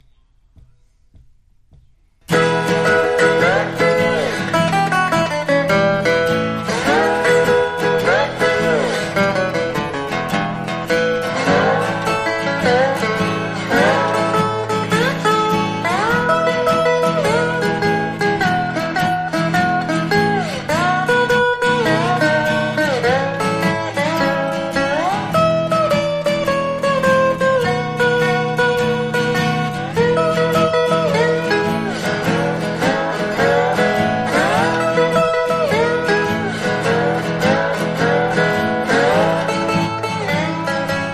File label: Boogie